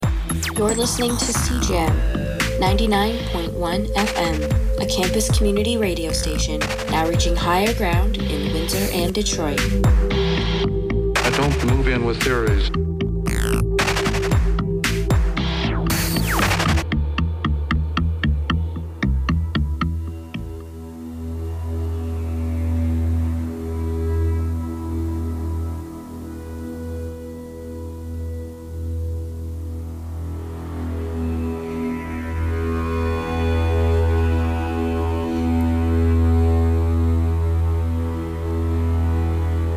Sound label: women soundscape rhythms, Zambezi; radio continental drift; Cjam 2015